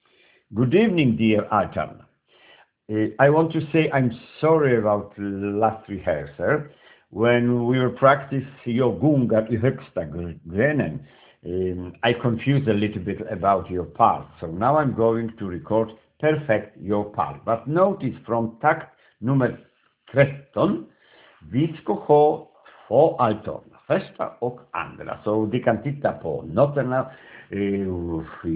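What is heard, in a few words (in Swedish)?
alt 1 och 2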